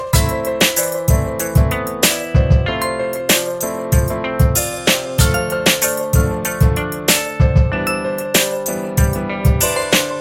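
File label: good ring instrumental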